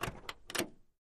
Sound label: Camaro Door Open